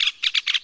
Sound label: squeek, wildlife; matmos hl